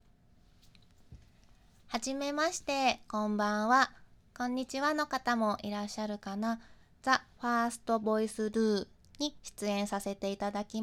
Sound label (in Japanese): 実演 オナニー